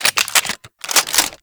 Reload, Weapons; Warframe; Gorgon

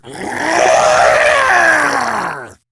Attack, Battle Barks; en; Grineer Ghoul Sawman

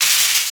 Shopping Cart Hit; Warframe; Ambience; Nineteen Ninety Nine

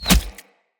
Hunter Bow Arrow Impact Flesh; Weapon Impacts; Bows; Envoy; Combat

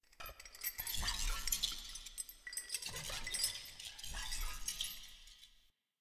Movement C; The New War; Erra; Cinematics

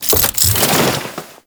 Turret Throw; Warframe; Corpus; Corpus Railjack; Crp Admiral Agent

